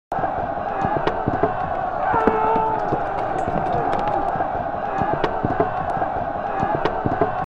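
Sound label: environment War; Joan of Arc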